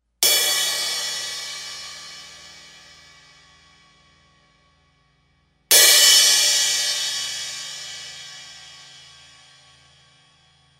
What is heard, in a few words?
paiste thin crash 18